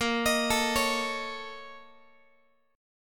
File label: Bb Minor Major 11th, Guitar; arpeggiated; x,18,18,19,17,x; 4A,4E,5A,5D,5G#,6C#